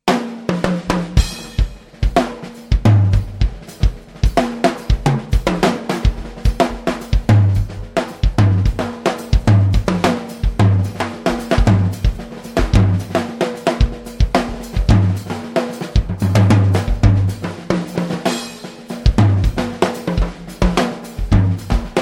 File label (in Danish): tromme; trommesaet fra maestro